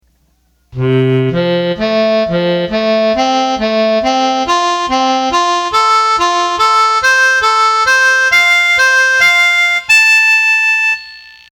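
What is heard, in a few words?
Harmonica, Roland accordion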